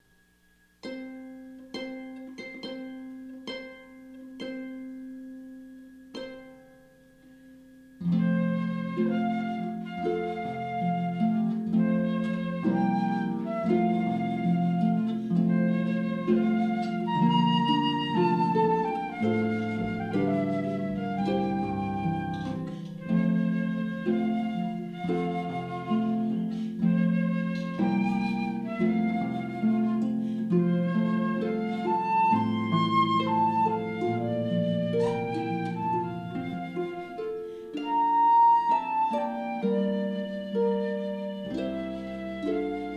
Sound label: Harp & Flute; Wagner; Wedding March